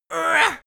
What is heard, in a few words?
imp hit; schreie